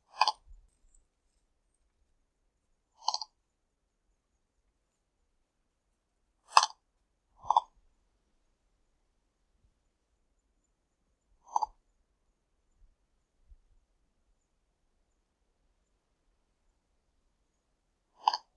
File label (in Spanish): cigueña cabeza pelada